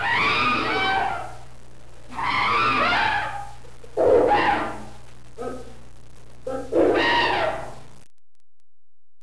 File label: chimp